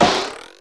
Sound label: Long Bow Impact; worms